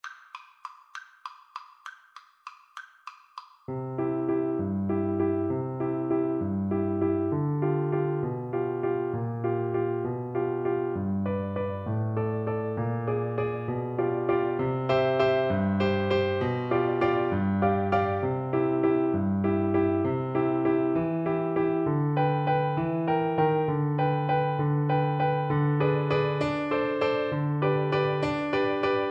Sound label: waltz, Oboe